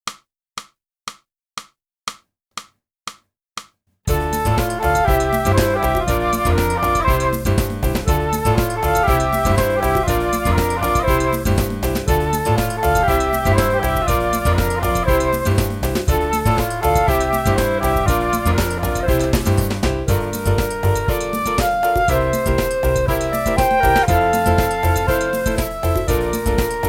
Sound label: flute; boosey